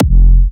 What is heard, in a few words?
Slobber F4, Kicks, Big Room Kicks